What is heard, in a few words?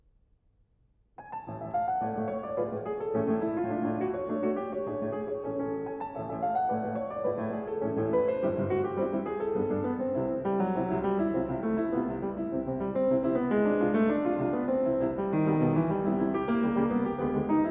Nocturnes for piano; Nocturnes (8); No. 2 (Bal de jeunes filles); Poulenc